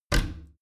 bathroom cabinet both close heavy; objekte; tueren

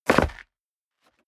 step leather shoes concrete grit jump; schritte

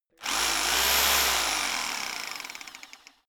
rock drill chisel burst rev up long; schrauben drehen hydraulik

